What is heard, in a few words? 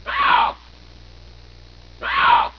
siberian lynx voice